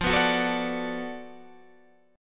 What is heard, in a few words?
B Minor 7th F, chord; Bm7/F strummed